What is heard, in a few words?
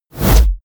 push